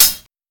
rich top end hi-hat sample e key, hat sample tuned to the E note